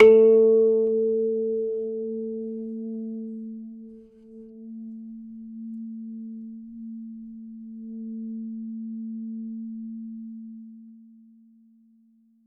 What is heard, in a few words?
make a philippine kudyapi, bamboo